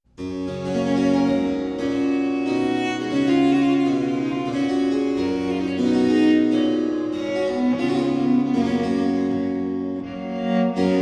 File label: pipa